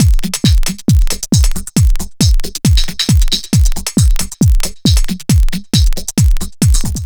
Dance Drumloop; Drums; Dance Beats

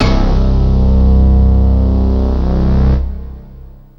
BELL SYN; SoundFactory; SMPMANIA

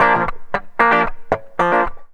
Electric Guitar, Loops; Guitars

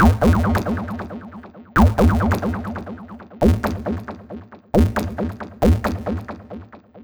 Electronic Percussion

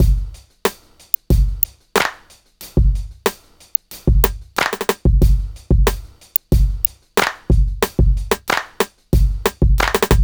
Urban Beat, Drums

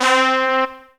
C3 BRASS; roland1

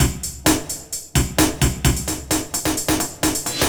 Old School Break, Drums

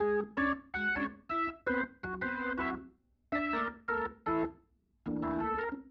Organ

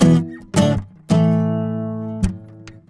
Acoustic Guitar; Guitars

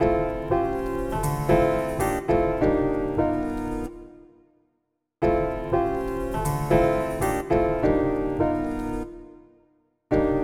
Acoustic Piano

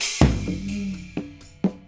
Acoustic Fill, Drums

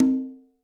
Acoustic Percussion One Shot

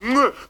player hit; theGoonies; Retro

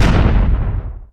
big rock fall; theGoonies; Retro

